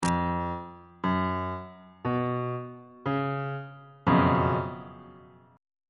g flat major 7th suspended 4th 3rd inversion on bass clef